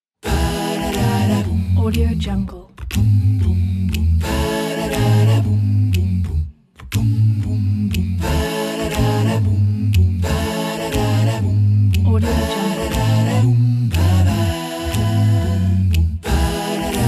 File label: jingle bells acapella swing